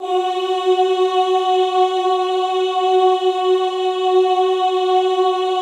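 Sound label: OOH F#3 left, classical choir; Best Service; Extended Classical Choir; OOH MIX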